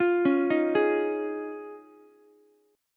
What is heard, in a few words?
F Minor 7th Sharp 5th, Ukulele; JGuitar